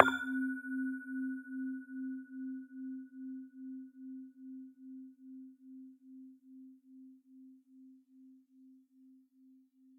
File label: Metal Xylophone; Instruments; Fleamarket Percussion; Ableton; Factory Packs; Lost and Found